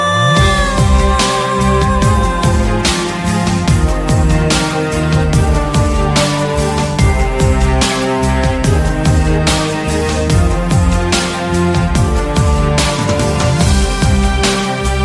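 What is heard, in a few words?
guitar music ringtone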